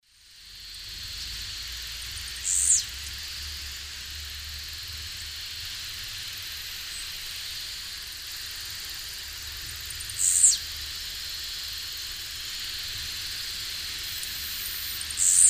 Volatinia jacarina, song male; S. America; Eastern Lowlands

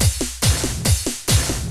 techno 140

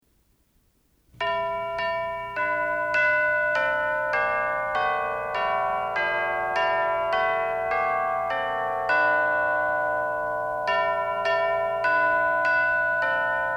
Christmas Organ & Chimes

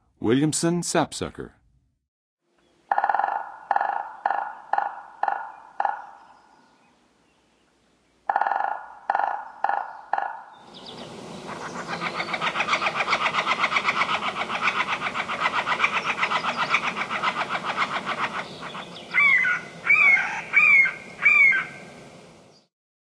Williamsons Sapsucker, Songs of Birds; North America; Western USA; Western USA Songbirds

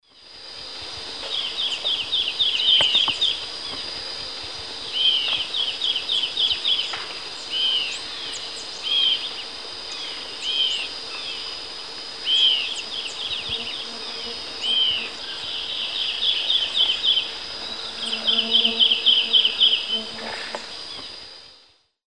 Hylophilus thoracicus, song; S. America; Eastern Lowlands

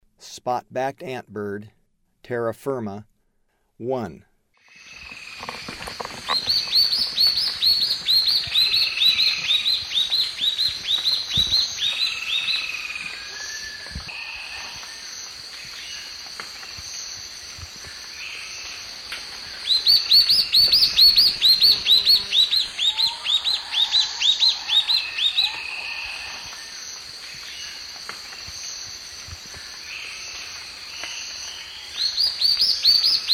Spot-backed Antbird (terra firme); S. America; Eastern Lowlands

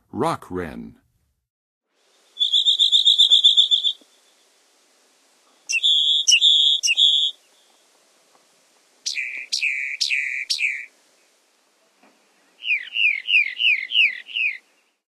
Rock Wren; North America; Western USA; Western USA Songbirds

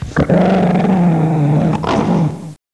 DOG

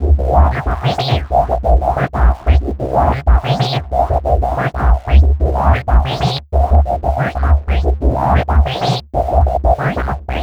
EXTREMELY ABRASIVE SYNTHS

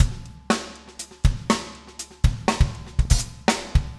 funk, 120bpm, loops

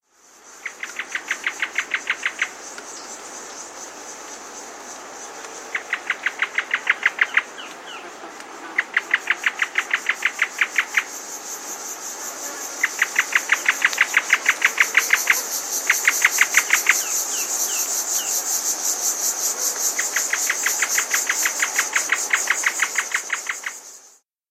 Restinga Antwren, S. America

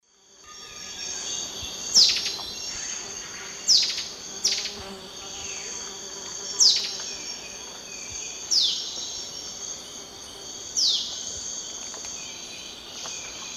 Lanio fulvus, alarm call and call; S. America; Eastern Lowlands

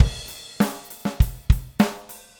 Basic Beat, Rock Drums

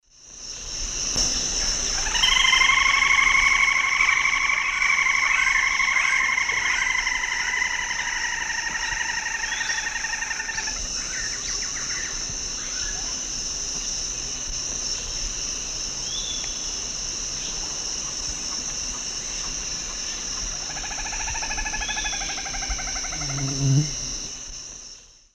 Laterallus fasciatus, duet; S. America; Eastern Lowlands

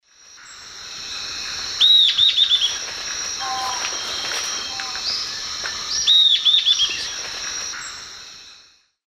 Lathrotriccus euleri, song or call; S. America; Eastern Lowlands

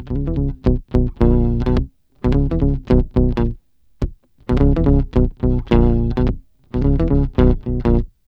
Bass, RnB

